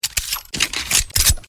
g19 reload; weapons

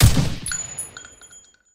m16a4 fire; weapons

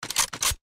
sr25 switch; weapons

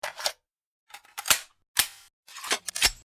acr reload; weapons

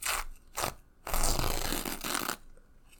gauze; healing

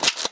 vepr12 switch, weapons

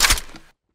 negev switch, weapons

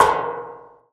large refinery barrel hit; hits

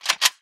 aug switch; weapons

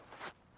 sand step; footsteps

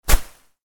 tree destroyed; hits

